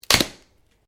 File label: Branches Dead Snap